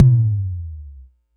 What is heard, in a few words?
digital tonal, Kickbank; mpk mini presets; Ableton Foundation FreePacks